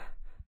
Breath; Lewd SFX

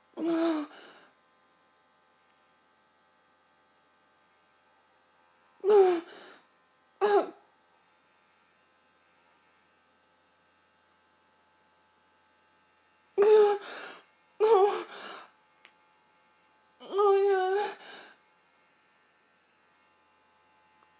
orgasm

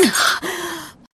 Grunt; Lewd SFX

